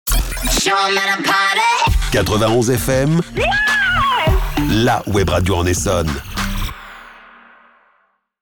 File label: Sweep ID; 91 FM; Essonne